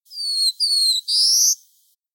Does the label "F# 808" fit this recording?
No